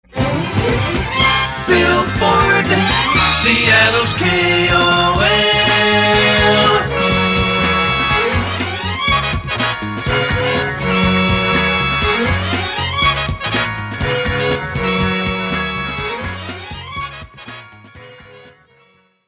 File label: Poly Sig; KOL; PAMS